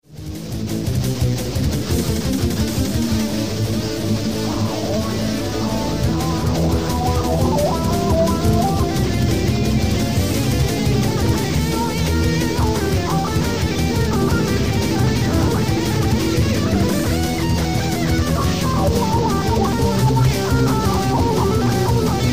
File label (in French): Guit Elec vieux metal solo; ici je joue plus vite et je découvre les joies de la wah-wah; Tous mes solos depuis la nuit des temps ont été improvisés; Enregistrements persos (avant 1990)